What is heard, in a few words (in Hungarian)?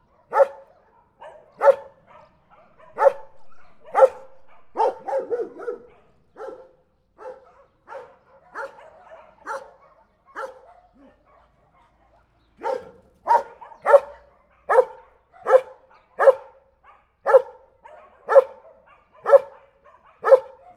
elszalad es vissza, nemet juhasz, hazorzo; Perkupa